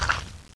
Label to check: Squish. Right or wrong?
Right